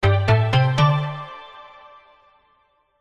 multimedia game tone sudden event tone musical finish complete